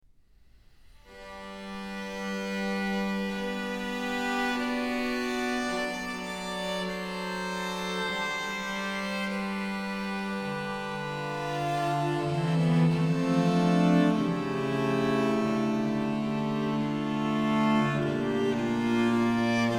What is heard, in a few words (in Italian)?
Consonanze stravaganti per l'organo